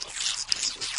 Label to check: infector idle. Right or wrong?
Right